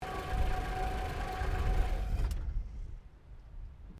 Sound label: CAR HOLDEN HD PREMIER IDLE BONNET OPEN SHUTDOWN, Classic Australian cars